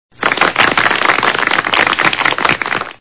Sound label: hakshu